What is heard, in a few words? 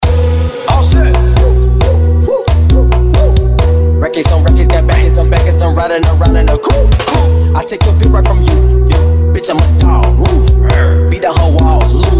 hip hop remix